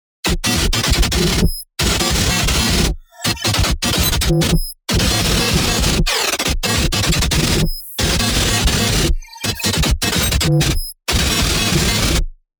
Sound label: dissonance